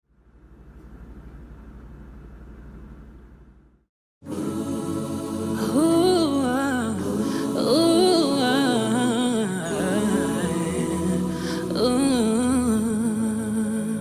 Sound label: Choir Cover